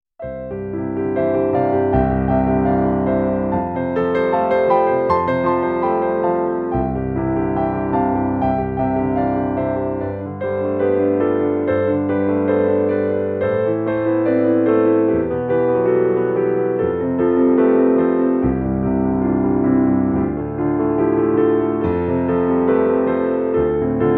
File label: Etude presto maestoso